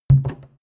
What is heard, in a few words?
canister empty put down